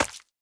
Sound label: Slime Impact Small